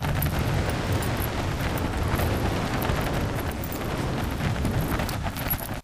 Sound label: ground pound wind rush; Titanfall 2